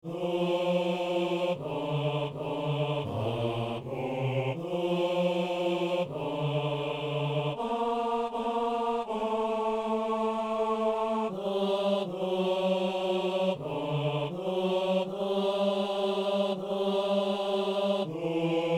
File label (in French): hommes